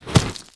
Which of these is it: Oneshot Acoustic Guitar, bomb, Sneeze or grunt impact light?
grunt impact light